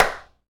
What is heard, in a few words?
hand clap sound f key, Royality free clap sound tuned to the F note. Loudest frequency: 1745Hz; percussion; single hits one shots